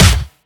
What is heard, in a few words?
Noisy Acoustic Snare C Key, snare single hit tuned to the C note